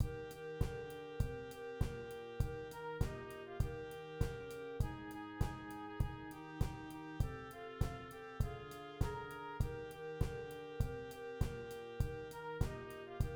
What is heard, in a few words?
perc; Jul